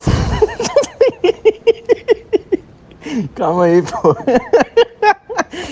risada

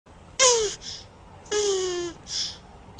tartaruga msg

